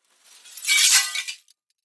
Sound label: Crash Glass Debris Impact; Crash Glass Debris Spill Dump Pane Sheet; Blastwave